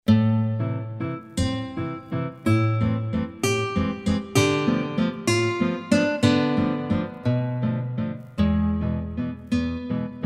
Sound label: ramirez guitar full